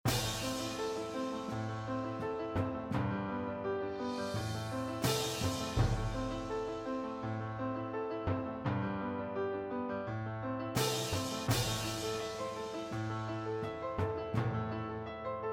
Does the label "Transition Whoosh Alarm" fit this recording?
No